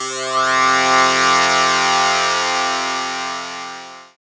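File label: synth Morpheus; Sound & Vision - Gigapack II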